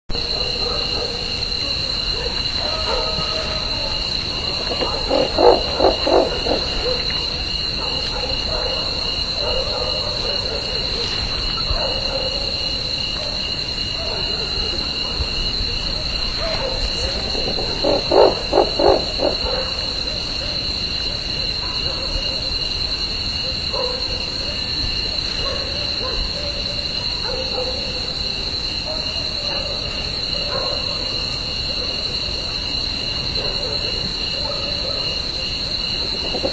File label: Strix chacoensis; PP Pampa del Indio; Jorge La Grotteria